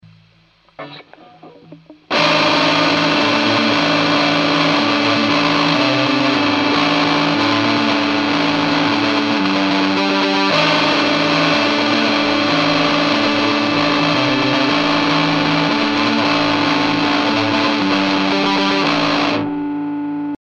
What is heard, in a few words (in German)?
Cort Both Pickups verzerrt